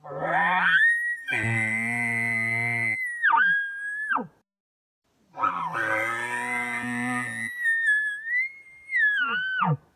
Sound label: Calls Lip Bawls